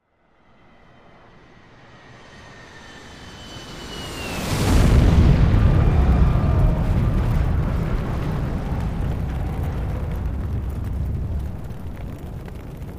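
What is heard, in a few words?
Plane crash, passenger plane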